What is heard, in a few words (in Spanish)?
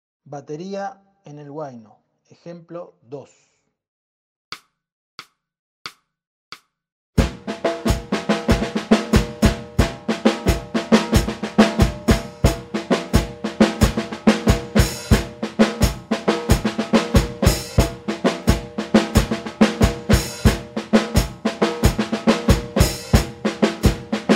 Bateria en el huayno